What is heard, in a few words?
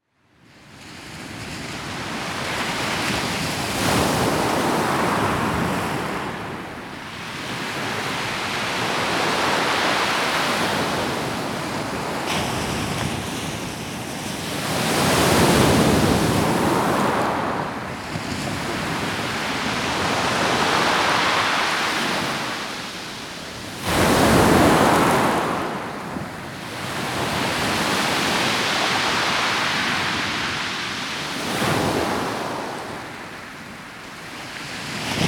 Sussex High Tide; wild soundscape